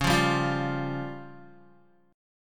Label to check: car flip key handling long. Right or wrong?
Wrong